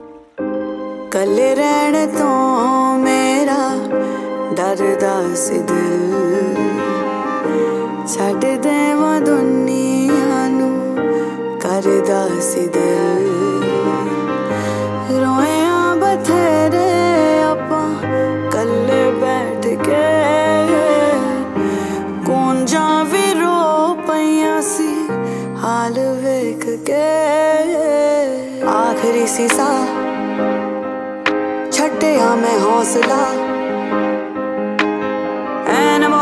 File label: Sad Ringtone